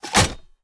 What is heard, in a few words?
Reload Top Close, weapons; Valorant; odin